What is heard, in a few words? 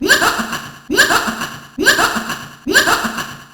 laugh x4; jevil